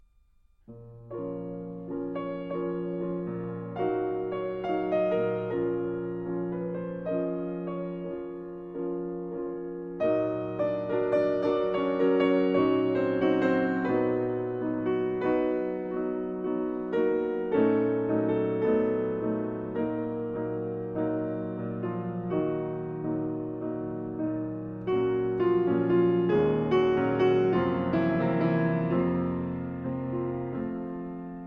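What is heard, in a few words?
Etude No 11 in B Flat minor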